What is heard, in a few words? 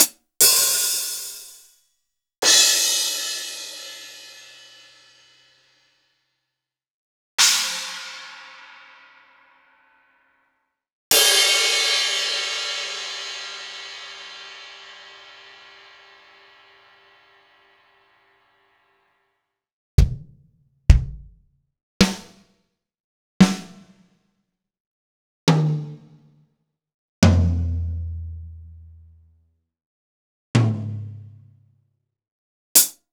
Akai MPC500 Kit